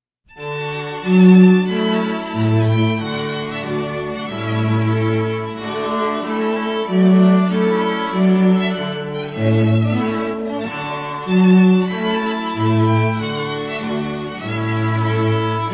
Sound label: trumpet tune; Trumpet Tune by Henry Purcell